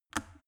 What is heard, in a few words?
plastic water boiler turn switch off